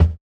Kick, jungle breaks drum sounds

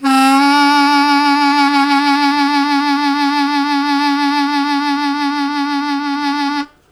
Strong Vib w Scoop D1, Harmonica; Zero G

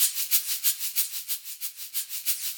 Shakerz, Percussion Loops

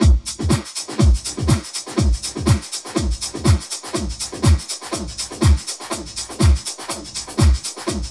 drum loops; Zero G; Malice In Wonderland

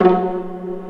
Vla Piz F#2; Digital Sound Factory Virtuoso

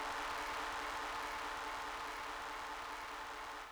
noise; Big Fish Audio; Hardcore Breakbeat Action; Core 129 Cmin

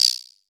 CR78 guiro; Roland BK-5

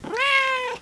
CAT MEOW; Greytsounds VOL-1

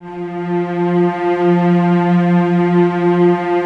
Cellos F 3, String Ensembles; Miroslav Vitous; LINKS ORCH

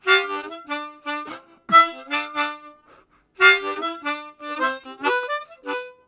Chorded, Harmonica; Zero G

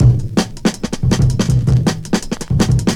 breaks 162

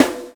Breath Snare; Sonic Foundry (Sony Creative Software); Drums & Drones; Construction Kits

